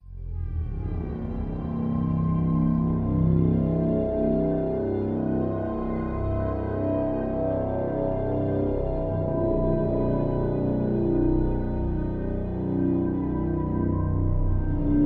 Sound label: elevator open, music; distillery